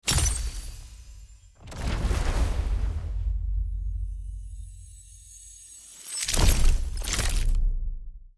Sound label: ui progress bar